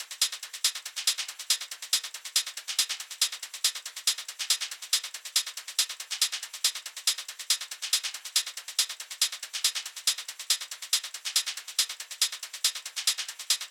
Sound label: HiHat Loop 140bpm